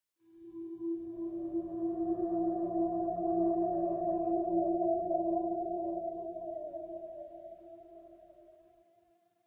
cemetery; portlux

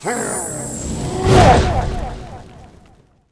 vampire dead

